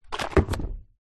food drink smoothy bottle down on surface; zapsplat

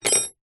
metal peg small thin set down wood

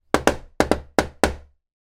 door knocks small glass window on wooden door; household

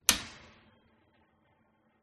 oven door open

